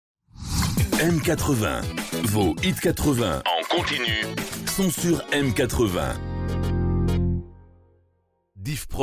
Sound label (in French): Sweep Vos hits en continu